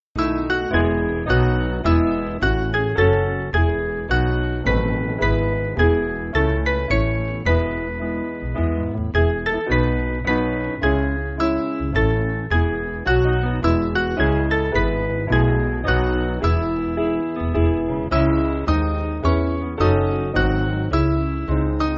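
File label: Piano, Free Music Backing Files for Churches; Noel; Mainly Piano (CM)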